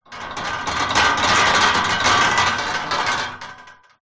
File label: impacts metal frame bed loose wobble rattle